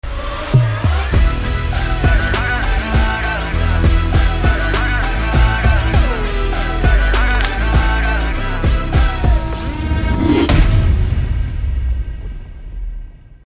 Teaser BGM Telugu Ringtone; Thalapathy Vijay

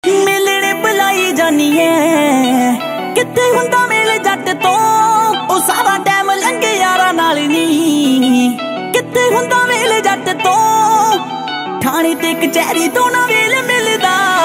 Punjabi Beats Ringtone

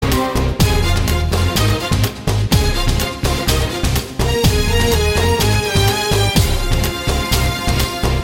BGM Telugu Ringtone

